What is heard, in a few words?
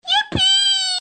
yeepee